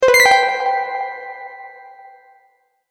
Magic Bright Bonus, video game

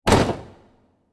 Small Impact Blast; Blasts; Impacts; Sound Effects:Impacts; CraftedSignals

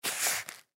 Camcorder Strap, Camcorder Place Hand In Strap; Sony Mini Dv; Blastwave

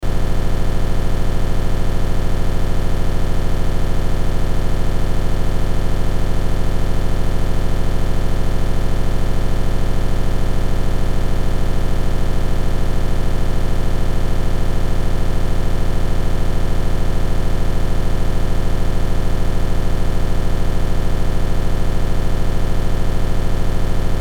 Noise Machine Hum Electrical Buzz Glitch; Blastwave

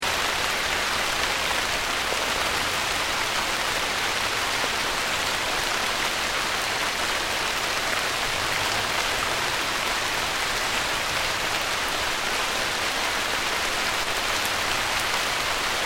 RAIN HEAVY FOREST LOOP; Elemental weather effects; Rain (Sound Effects:Weather); SoundEffects-Foundation4

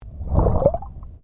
Underwater Movement Swoosh; Submerged impacts created by dropping a weighted rope into the ocean; Splash Sound Effects

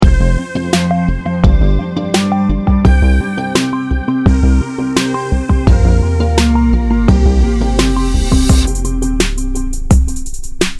Retro Music Ident 85Bpm; Musical Sound Effects

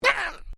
Created Creature Small Ouch, Alien Sound Effects; Created creatures, vocalisations and pretend speech

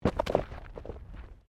Body movement Impact Roll Gravel

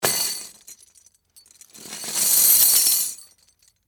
Chain Drop Pile, Horror, Chain, Drop, Pile; Scary Soundscapes (Sound Effects:Horror)

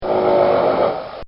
Horse Grunt; Horses; Animals

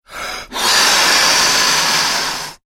human blow ballon; Balloon Being Inflated; Balloons; Cartoons

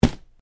PUNCH; Various Organic Foley sounds; Punches and Hits (Sound Effects:Combat); SoundEffects-Foundation4

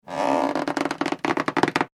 balloon rubbing squeak; foley; McKinney Sound

